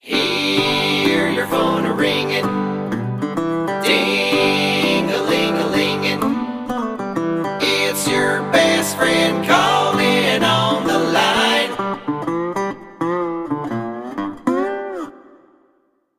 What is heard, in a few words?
best friend call country folk ringtone